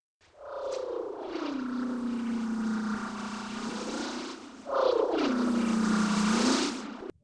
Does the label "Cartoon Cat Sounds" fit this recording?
Yes